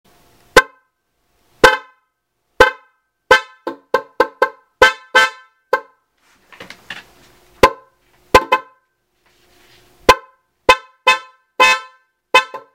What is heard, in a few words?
horn